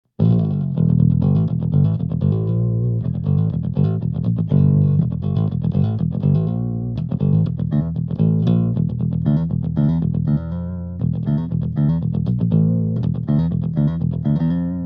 EBS Mikro Pick; Bogart

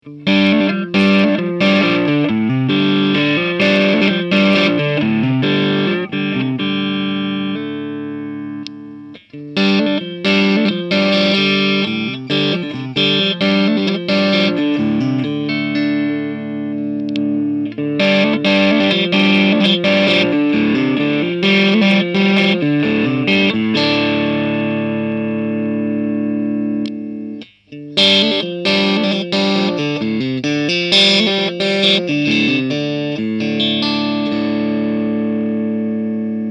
angezerrt hals bis steg

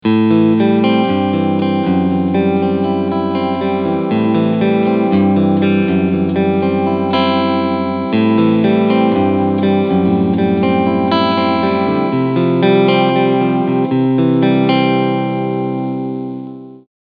Cleansound beide PUs SC Mode; Ibanez RGA60AL ABL

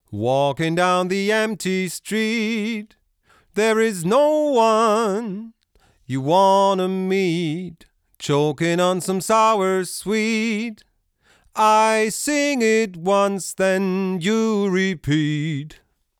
API Audio 2500 Vocal 50 Prozent Kompression Cross

